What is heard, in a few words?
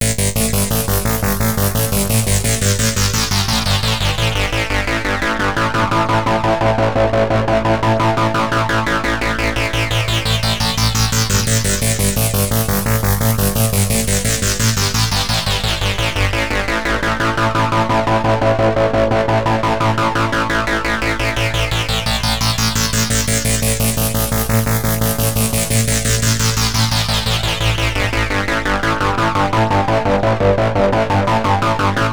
Virus Arpeg; Pro Tools Essential Demos; Digidesign